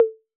Conga Hi B 808; Clean; Digital

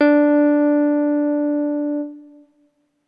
deep D3

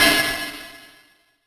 Ride Cymbal

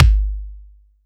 BD

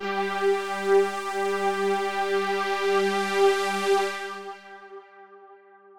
analog G4; analog server

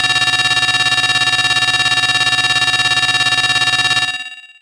Alarm Call DX100 G3

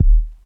MonoEvolver Sub Thump Vinyl Synths D#0, bass

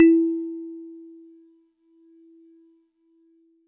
Celeste DX100 E3; Pristine Celeste

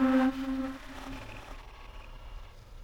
Tree Frog Trumpet C3

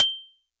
Xylo Snare DX100 G7

